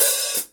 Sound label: 13 dark crisp hi-hat open; paiste 13 signature dark crisp hi-hat cymbals